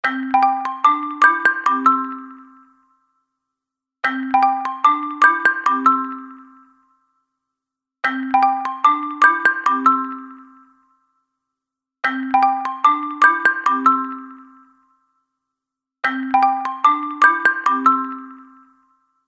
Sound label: Knock me, ringtones; Vsmart; Star 4